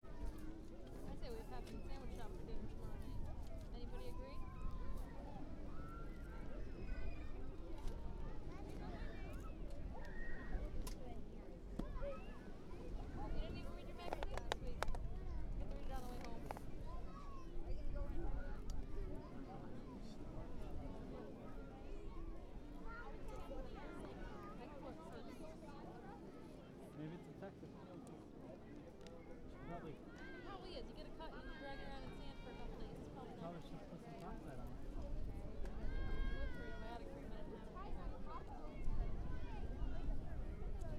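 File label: background sound summer beach atmosphere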